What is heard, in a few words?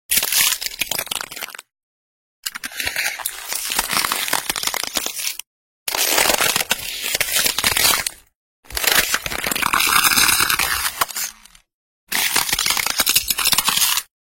Ice Cracking Sound